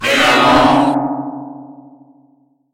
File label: jumpscare